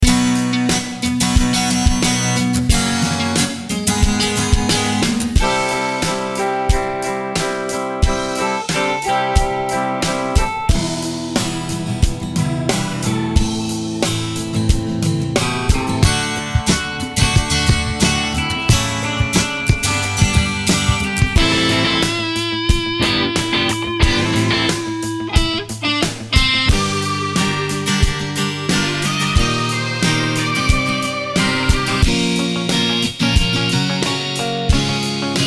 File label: Acoustic Rock 80BPM to 100BPM; Acoustic Rock Demo